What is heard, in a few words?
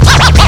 scratch; Hip Hop; E-MU Formula 4000 Series Vol. 1; Hip Hop Nation; Bonus Perk